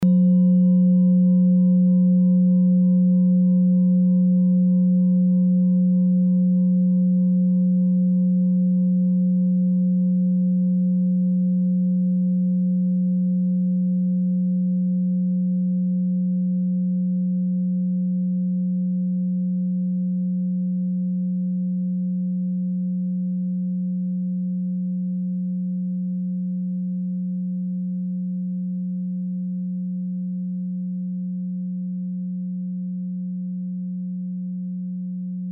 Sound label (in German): klangschale tibet